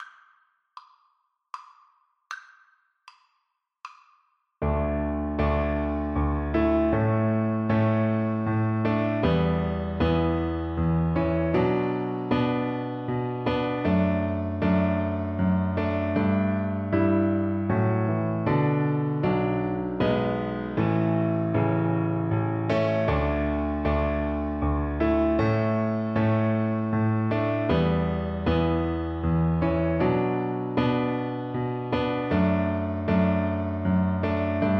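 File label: chaconne VLC karaoke, Cello; fairy queen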